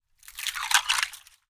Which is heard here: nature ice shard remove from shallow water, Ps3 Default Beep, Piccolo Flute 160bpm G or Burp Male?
nature ice shard remove from shallow water